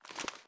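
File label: foley assault rifle akilo47 aim down sights down; weapons; viper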